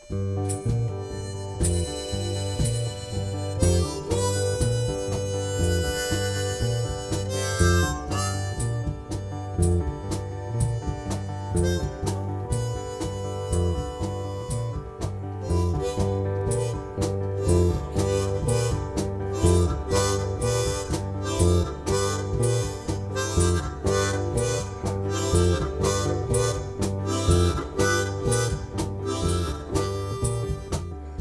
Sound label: Instrumental